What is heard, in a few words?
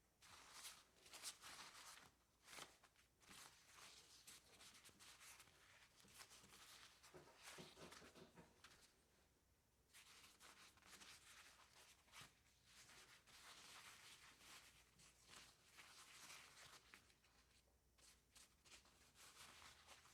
reaching for napkins